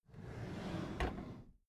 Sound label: packed drawer smooth clatter slide open